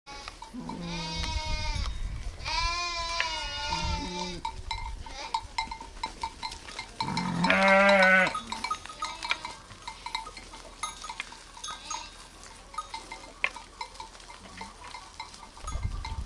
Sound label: flock